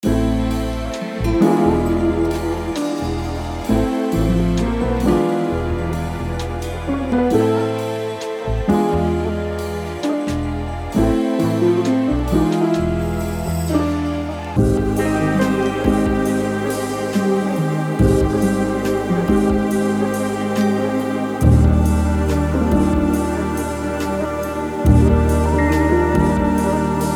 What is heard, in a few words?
Vintage Tapes; vintage soul; ric beats